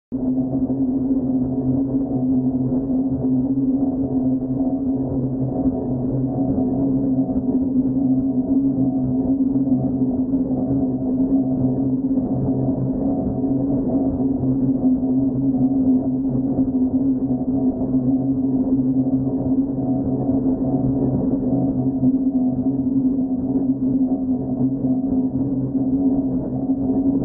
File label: Space Station Sound Effect; Dreamscape